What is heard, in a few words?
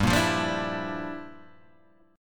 G Minor 13th G down, chord; Gm13 strummed; JGuitar